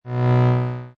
Energy Transfer Short, Science Fiction Sound Effects; Energy being transfered from one place to another, or force field, electrostatic, scifi static; Lynne Music